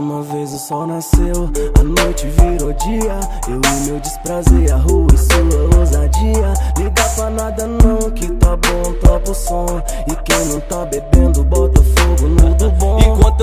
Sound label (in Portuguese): musica; cstrike